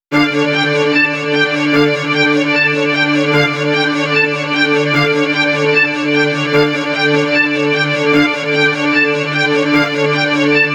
Gramophone; Clock Tower 20th Anniversary Sound Collection

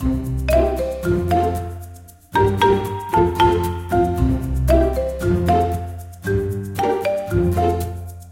Finale Outro Loop; Quest AuntArctic; club penguin island; android

